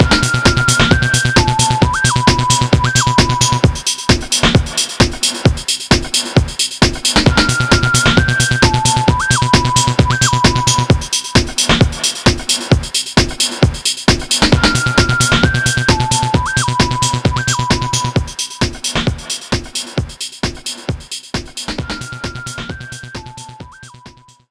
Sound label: Traning BGM; sansuu puzzle de migaku gakken otona no shikou sense ds gamerip 2007